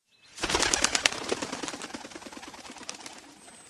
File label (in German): elster vogel